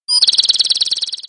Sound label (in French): L’oiseau Dui Siffle